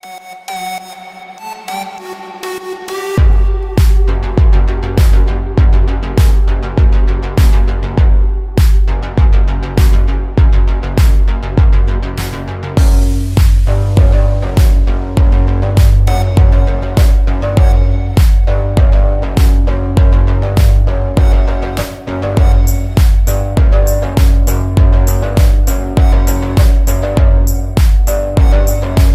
onyx bass

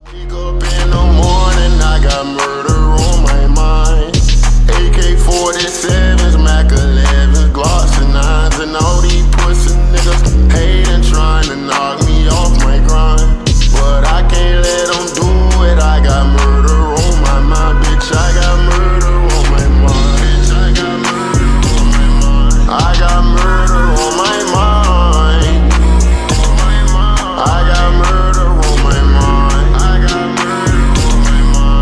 slowed reverb

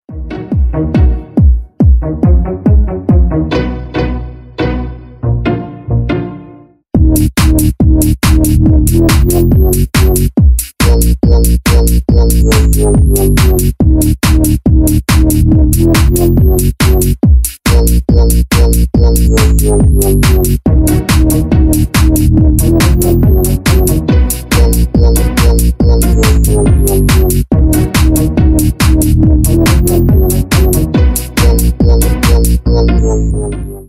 skank beats

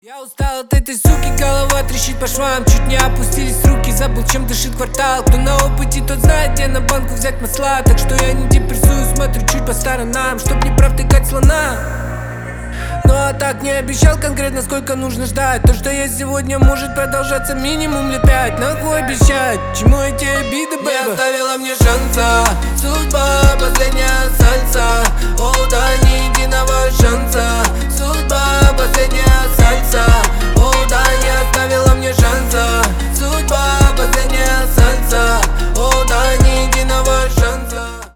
salsa

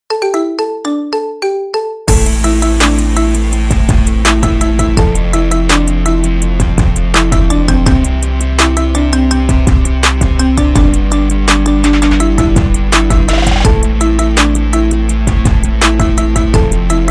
marimba remix

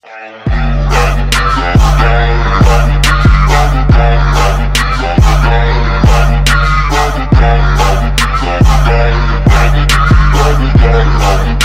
remix version

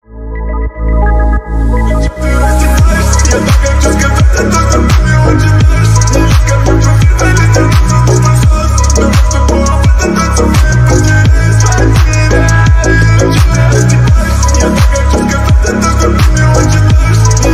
remix

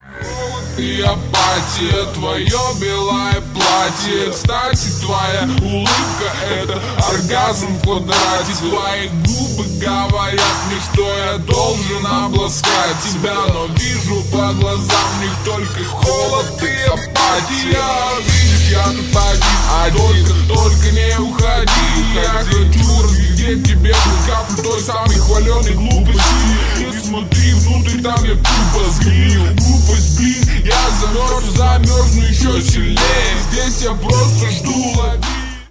slowed reverb

